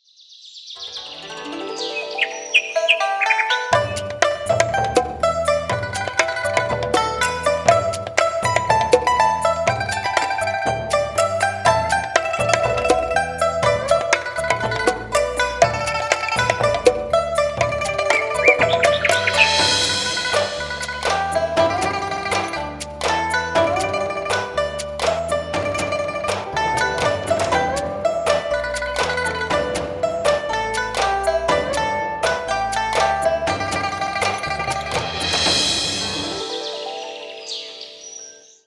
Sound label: happy; BenQ; B502